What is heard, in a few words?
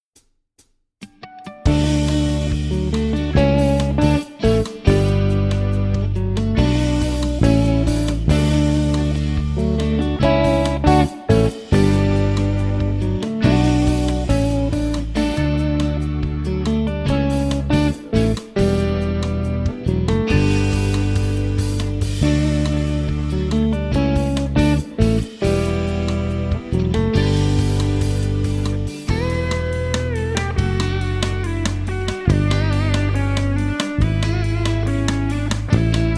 instrumental